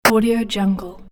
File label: 8 bit push